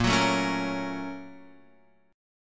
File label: A sharp Minor Major 7th Sharp 5th, down, Guitar, Open F; A#mM7#5 strummed; JGuitar